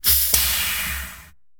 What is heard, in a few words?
capsule open